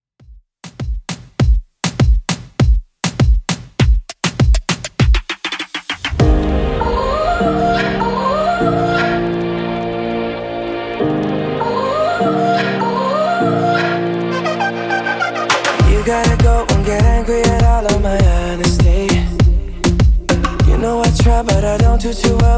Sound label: 2ks Redrum 100bpm